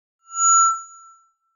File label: Microphone Feedback